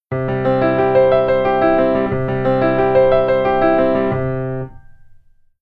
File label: Keyboard Pan